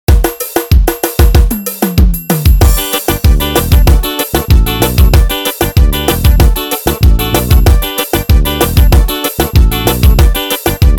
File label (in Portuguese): forro vanera; yamaha